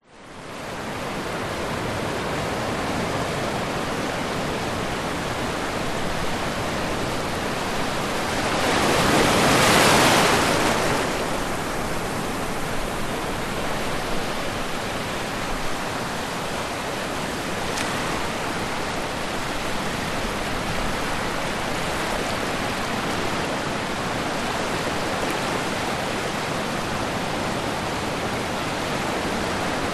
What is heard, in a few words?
nature ocean sub tropical distant surf smaller waves lap onto sandy beach